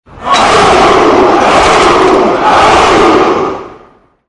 grito guerra

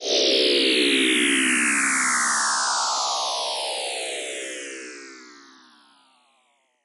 descendente

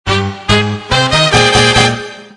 separador musical

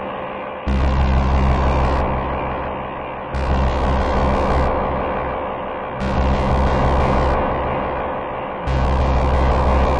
oscuro

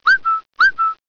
correo doble silbido

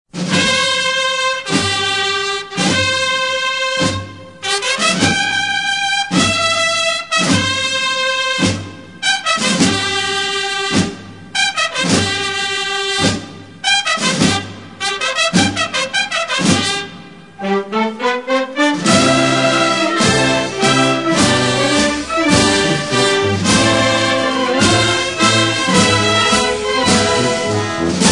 marcha funeral

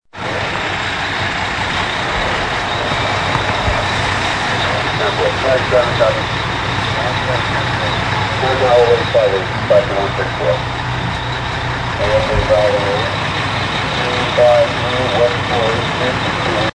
Camion bomberos

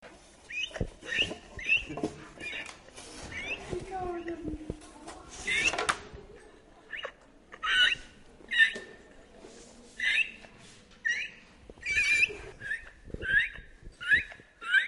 humana

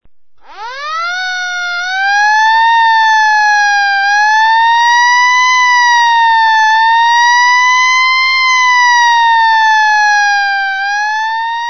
bomberos sirena bomberos

